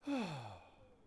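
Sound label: Swordsman Heal Vox; Civ4 warlords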